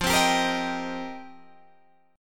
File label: F 7th Suspended 2nd Suspended 4th Guitar DADGAD, chord; F7sus2sus4; strummed; 15,15,17,15,18,17